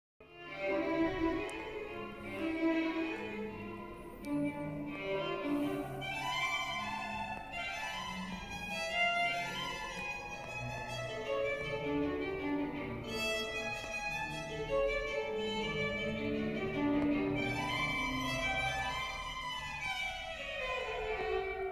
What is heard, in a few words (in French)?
violon